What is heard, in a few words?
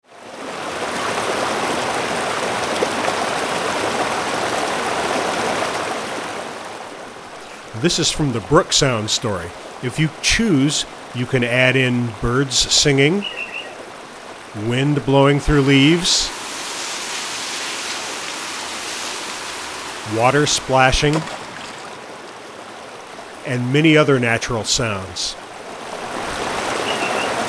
brook